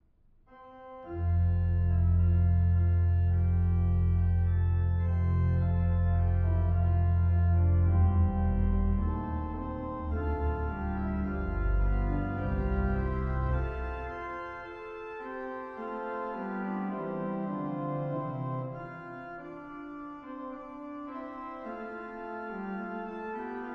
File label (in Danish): forspil F-dur; I østen stiger solen op